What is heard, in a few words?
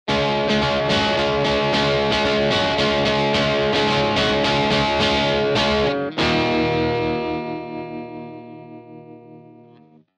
guitar drive